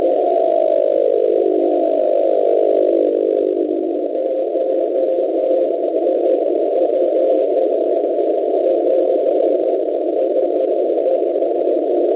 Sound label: cw